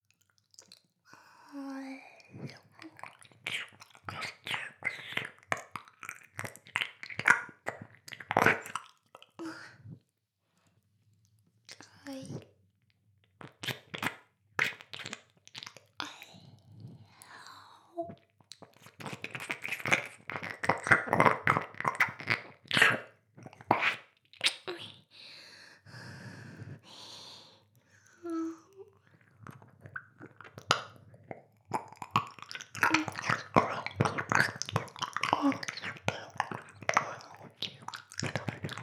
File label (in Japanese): 耳舐め, ASMR; 全妹計画２; メスガキ妹; 全妹計画<オール・シスターズ> 2妹め